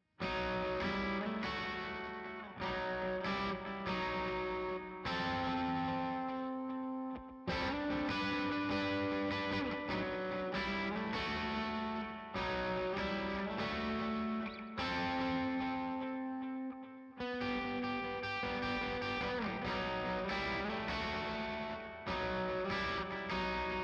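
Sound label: guitars; stems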